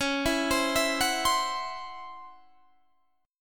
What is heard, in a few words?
Db Minor Major 7th Double Flat 5th Db down Guitar Standard; arpeggiated; DbmM7bb5; JGuitar